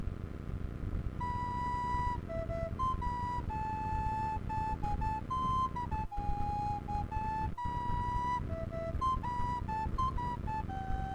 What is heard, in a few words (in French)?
flute a bec; Yerushalim